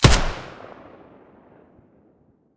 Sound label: ash12 silencer mono, weapons; STALKER; IWP